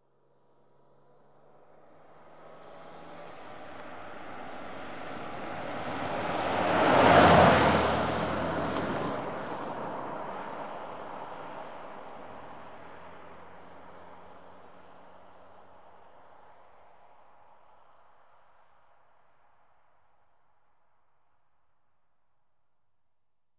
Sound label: ext- fast pass left to right, Cars; BBC Sound Effects Library